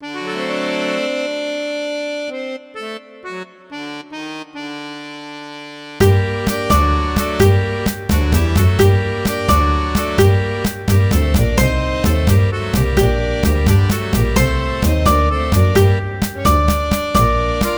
Música (com melodia e acompanhamento)

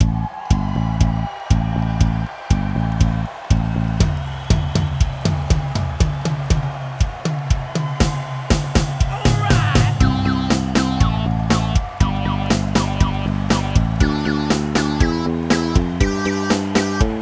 Rock'n'roll com publico

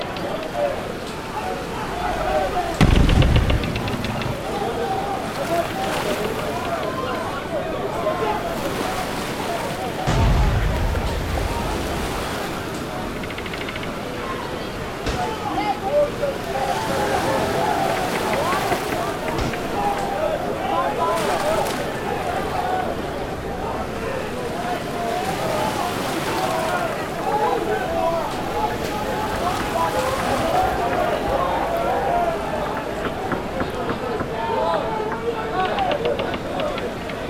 Ambiente Pirata mar tiros

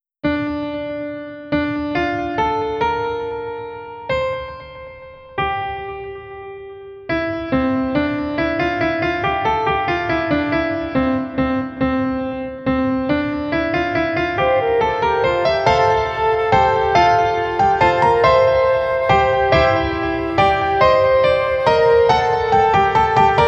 Música diálogo - Pirata

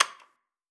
botão

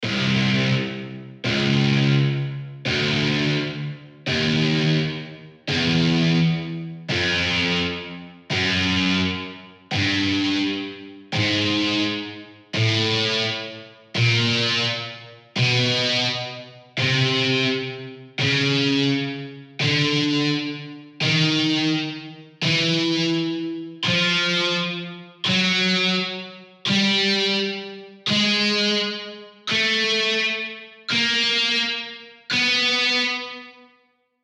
Rock Notas -

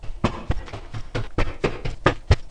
Subindo escadas; Narrativa

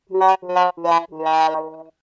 trombone (pra quando o jogador perde)

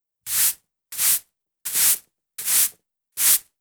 Varrendo; Narrativa

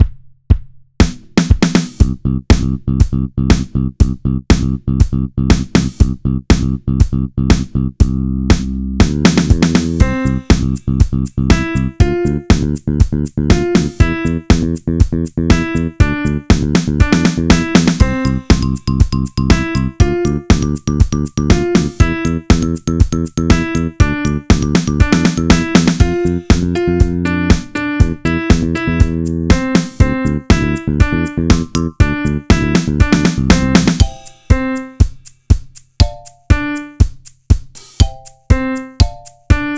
com piano; 3ª fase - Musikinésia

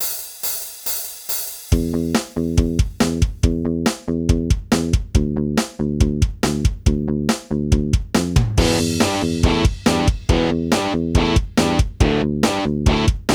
sem piano; Fase 4 - Subúrbio

